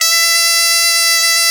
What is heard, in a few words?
BOMB2 F3, BOMBARDE2, Celtic Flavours; USB Soundscan vol.42; AKAI